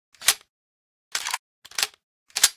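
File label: l85 grenade load; weapons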